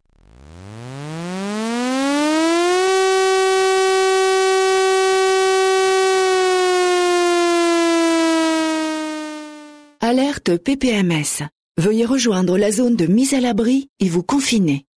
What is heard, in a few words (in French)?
Mise a labri et confinement avec signal